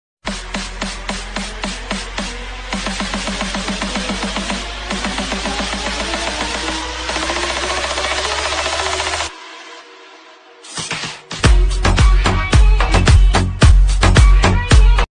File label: english song dj remix ringtone rap dj remix ringtone english mashup